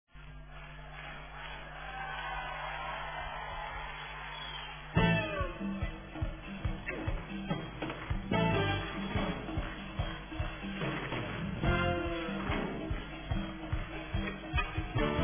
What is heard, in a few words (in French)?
ouverture orchestrale